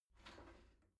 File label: shower slide door slide open soft